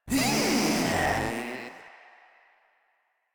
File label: Proto Infested Runner Player Spotted; Warframe